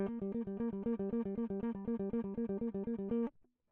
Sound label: electric